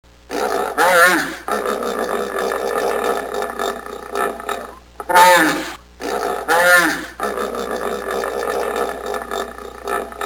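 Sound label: hippopotamus voice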